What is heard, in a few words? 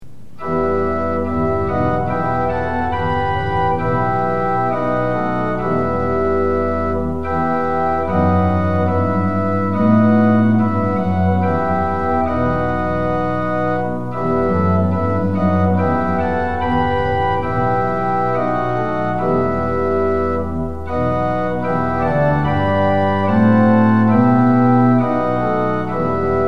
tune; hymns; lillenas